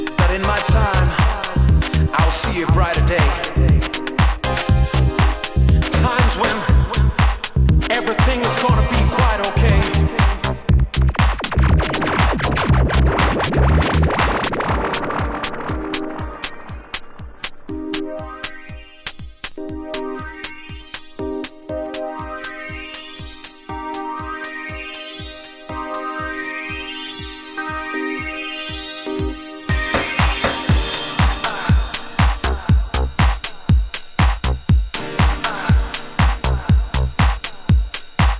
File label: groove